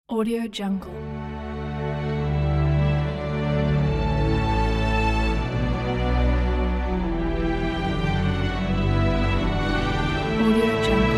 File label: the romantic orchestra; cosmicsounds